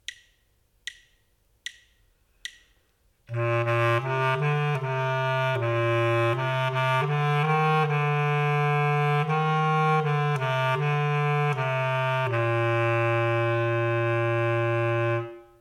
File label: melodic dictation